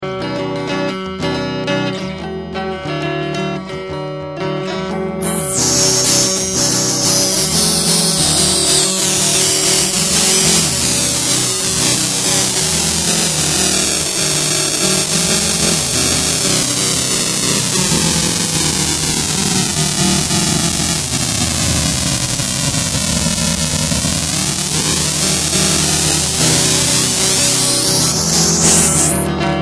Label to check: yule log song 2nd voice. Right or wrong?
Wrong